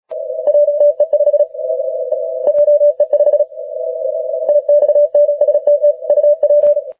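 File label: 30m continuous wave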